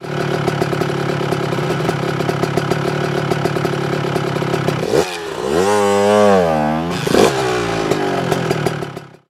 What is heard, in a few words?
bike enter cage; Ringling Bros. and Barnum & Bailey; Wii